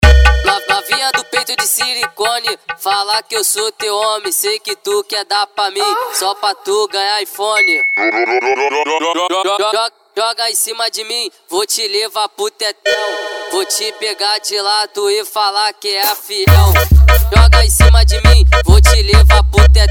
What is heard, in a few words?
funk mega bass